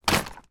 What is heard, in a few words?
household pushchair folded up drop on street